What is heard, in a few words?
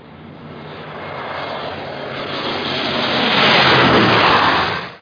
aircraft